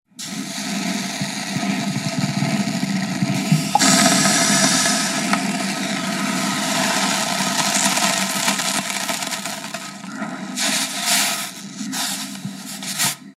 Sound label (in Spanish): agua hirviendo